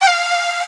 vocal fleet F; SD AURORA Sample Pack; Slate

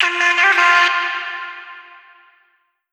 phrase F, Vocal; Slate Digital Omnivox Vocal Sample Pack

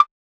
perc alert, Hip-Hop Drum One Shots; SD CAICOS Hip-Hop Sample Pack; Slate

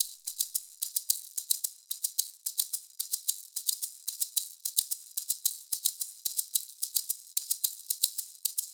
maracas loop, 110

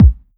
Kick, 909 Kicks; Slate; Vintage Drum Machines Volume 3 909 Edition

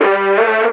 vocal backup Am; Slate; AURORA Sample Pack; Vocals

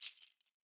rattles Single Hit Clams; Slate; SD SEISMIC Drum Sample Pack

